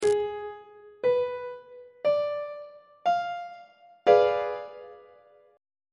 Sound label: a double sharp diminished 7th 3rd inversion on treble clef